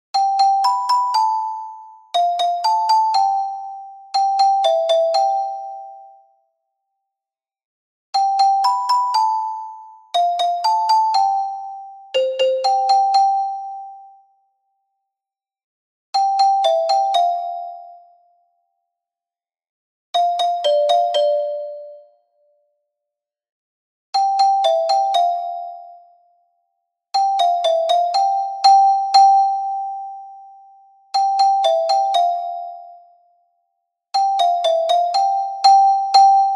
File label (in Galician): Carillon Contralto